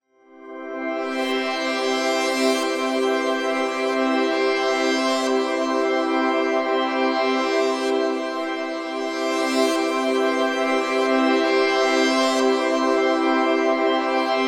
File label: Sweeper Swells